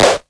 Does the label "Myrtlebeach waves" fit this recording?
No